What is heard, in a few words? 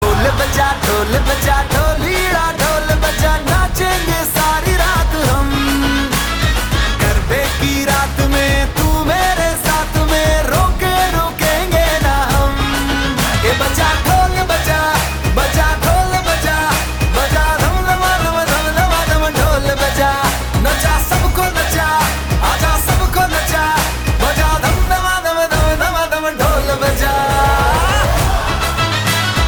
dhol ringtone song